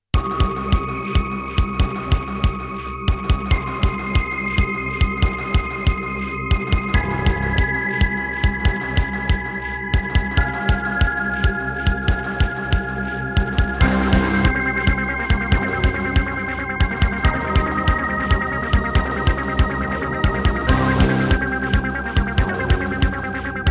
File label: Boss Dr. Synth DS-330